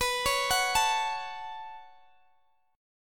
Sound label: B 7th Suspended 2nd, Guitar, Open D